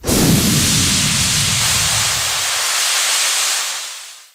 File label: breakdown; sorin